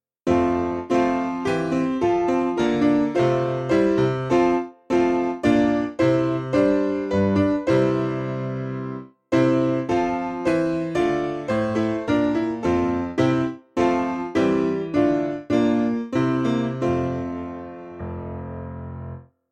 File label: Dunfermline Tune